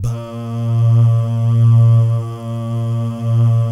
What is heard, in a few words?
BAH GUY, Vocal Planet Jazz & FX; ILIO; BAHS GUYS